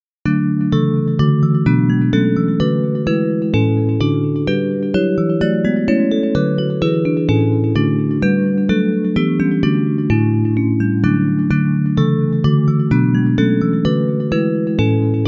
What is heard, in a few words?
Bells